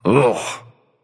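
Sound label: nonverbal ugh; smite; voicepack; merlin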